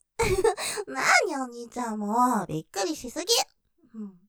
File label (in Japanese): キス いちゃいちゃしながら, ASMR; ステレオ; ループで楽しむ！シェフの気まぐれ抜き抜きコース